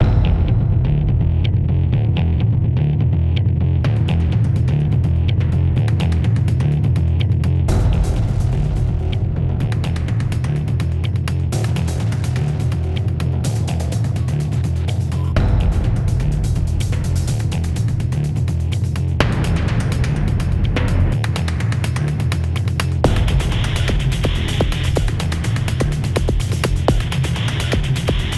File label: Remix; Driver Renegade